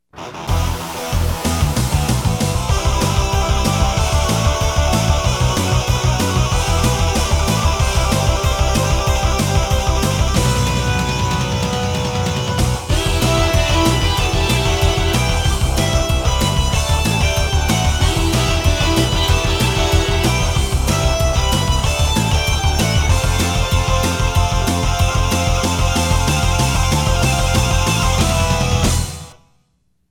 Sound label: Instrumental; TV End Theme